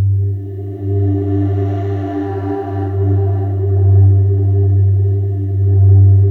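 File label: atmosphere; Sound Gallery - Charged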